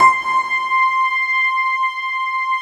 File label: ISLAND PN